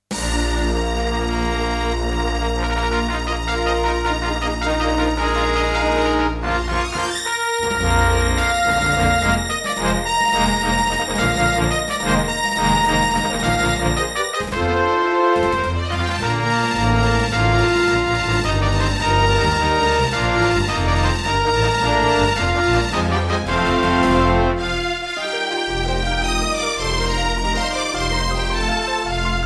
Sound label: Intro, Soundfont; EmperorGrieferus 131MB Soundfont; Dark Forces